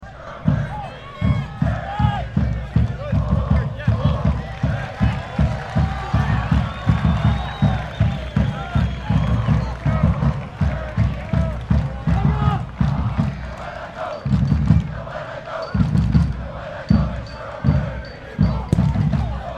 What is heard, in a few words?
Grandstand Effect